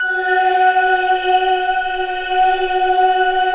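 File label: mystery pad, Stack; Optical Media International; Sonic Images Library; Mellowery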